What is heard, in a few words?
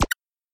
pause retry click; Cozy Skin